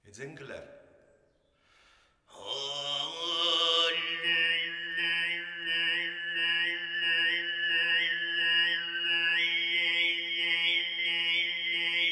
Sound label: ezengileer Style